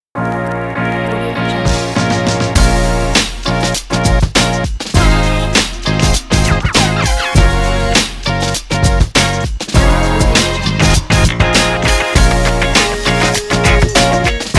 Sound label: stylish energetic hiphop ident; puremusic